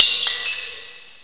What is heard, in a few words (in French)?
echec; MOBICLIC41